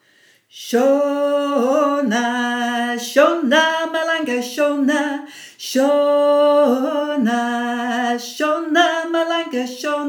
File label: tenor shona malanga; sing for water 2020